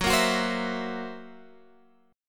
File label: Gb 7th Flat 5th Gb down Guitar Open G